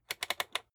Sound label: rotary control turn hard